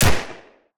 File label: Action Gun Shot